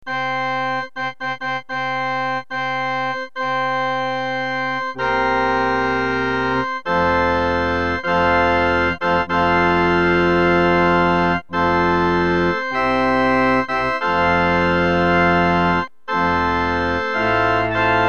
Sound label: organ; tahorn midi keyboard